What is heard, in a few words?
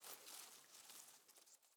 leaf rustle crunchy soft